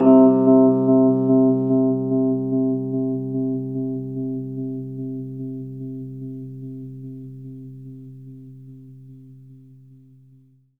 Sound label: RHODES OCTAVE; 3D Sound; 3D Keys VOL-1